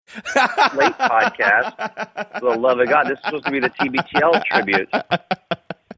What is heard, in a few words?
laughing while talking